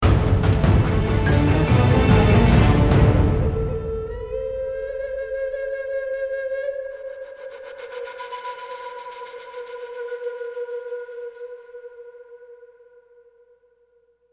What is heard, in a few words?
Combat Stinger